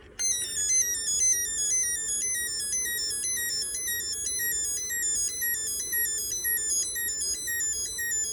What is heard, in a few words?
galaxy transformer light sound, robot toy